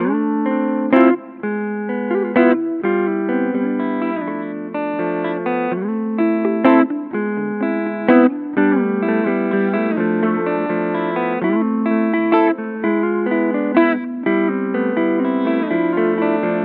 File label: Godin Radiator Burst clean neck